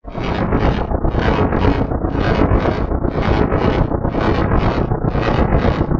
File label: condenser bg; sorcery